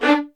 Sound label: D, LOUD VIOLINS; Q Up Arts - Denny Jaeger Master Violin Library